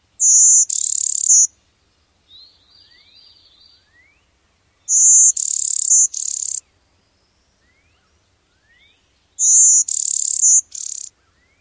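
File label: song variant, Blue-winged Warbler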